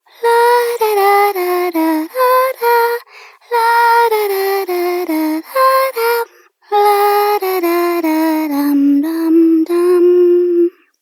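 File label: Child Rhymes Hum, Child Female; Duviri; Childrens Rhymes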